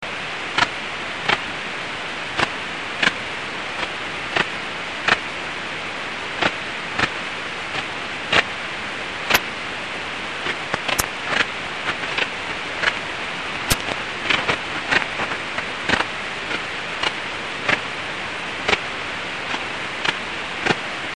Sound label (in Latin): pholidoptera griseoaptera; heuschrecken; insekt; wirbellos